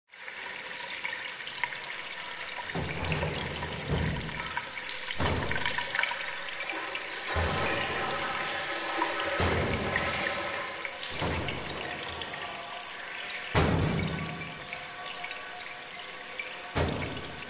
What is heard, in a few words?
Locker Room Ambience, showers, gym